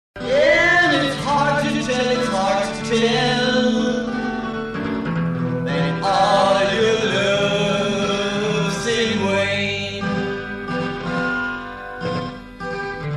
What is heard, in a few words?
acc. guit; Muscheid 79